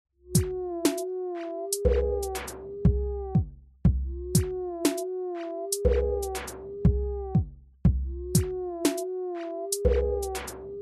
instrumental

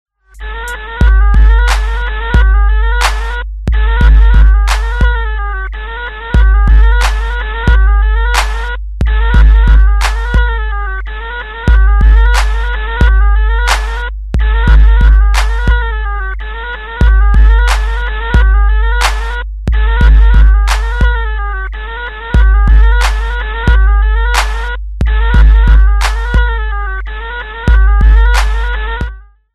instrumental

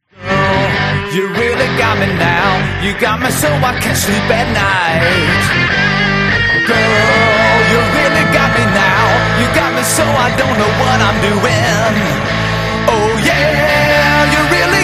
minus drums; play along drums classic rock

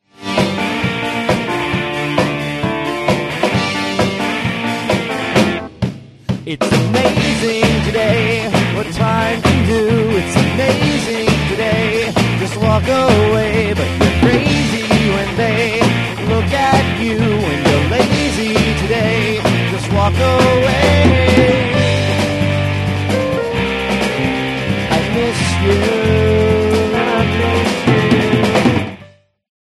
bells strings

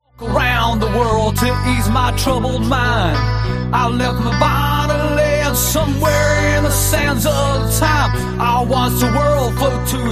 minus drums; play along drums rock pop and blues

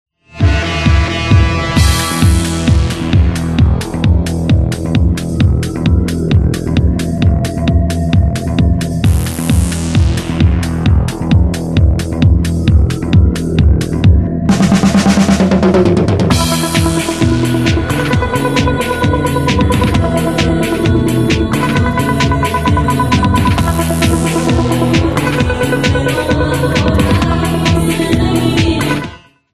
in trance

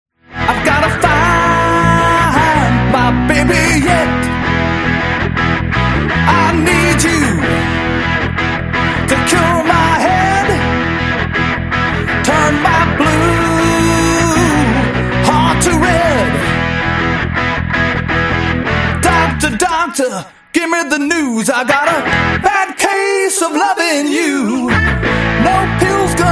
play along drums classic rock minus drums

